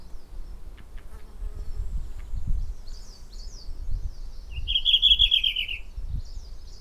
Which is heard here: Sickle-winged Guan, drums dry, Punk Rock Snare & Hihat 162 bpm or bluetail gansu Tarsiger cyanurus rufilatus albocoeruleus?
bluetail gansu Tarsiger cyanurus rufilatus albocoeruleus